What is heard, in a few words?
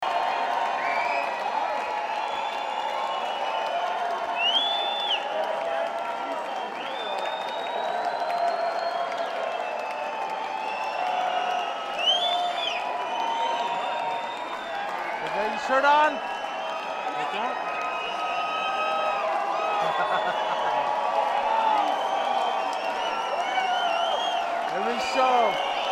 encore noise; Balboa